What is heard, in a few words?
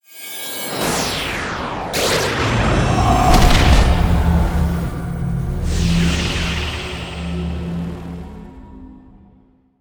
wakeup